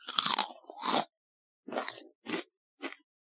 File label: eat chips; Golden Crayon Sounds